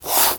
Rubbing Scratching Papier Verre Fin

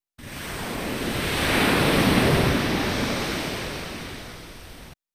wave synth

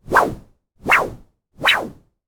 whoosh

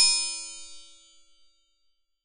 synth

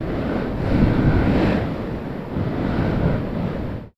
wind synth

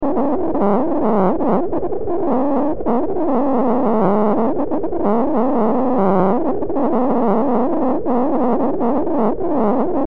Force Synth

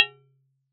synth

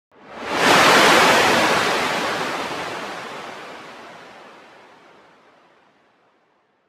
wave

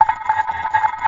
Rotate

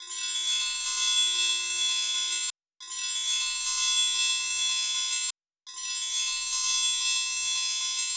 extension bells

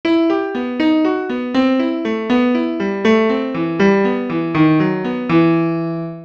music stimuli

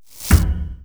Fall Down

